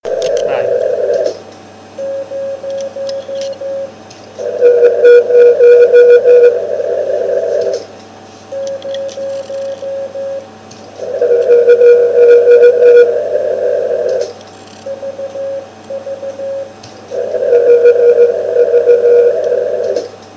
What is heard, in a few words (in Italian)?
echi cw, 1296 eme; CW echoes; iz1bpn